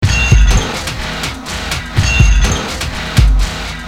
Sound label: depressing rock music